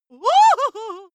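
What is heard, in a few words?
female scared comic, screams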